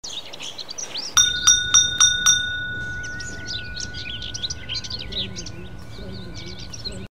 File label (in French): Cloche de porte en metal antique